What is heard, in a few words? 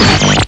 radio tuning, ambience; svencoop